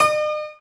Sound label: Beat